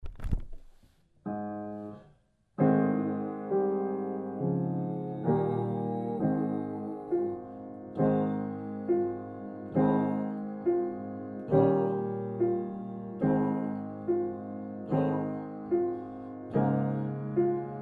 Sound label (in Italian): bassi; piccola canta